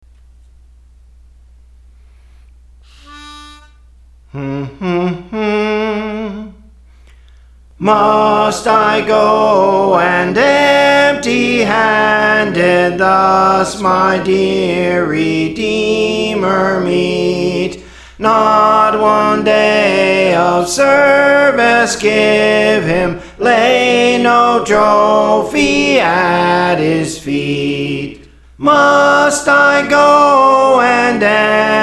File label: Soprano, Songs; Praise For The Lord Training; Must I Go and Empty-Handed